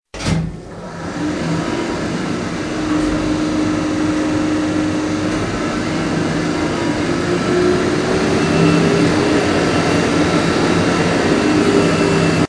trim pump, pampanito

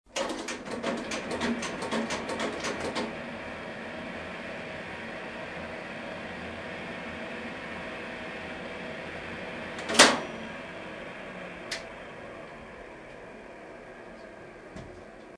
drain pump

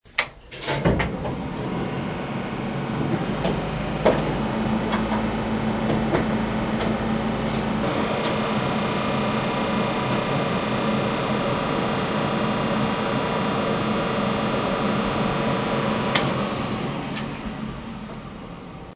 hydraulics

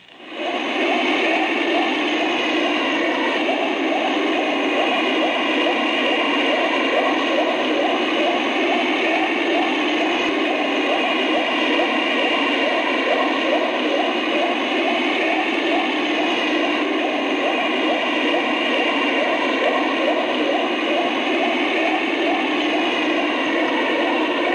submarine prop

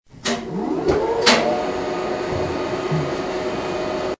vacuum pump

